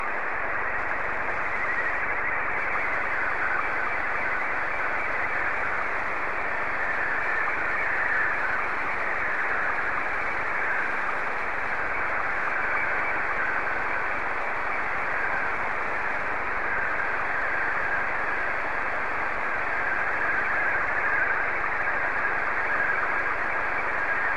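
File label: Scottie 2, slow-scan television; BG4TXR; SSTV; VK4MSL SSTV RX @ QG62ln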